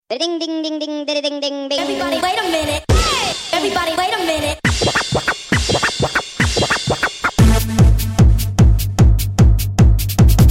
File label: Crazy Techno Mix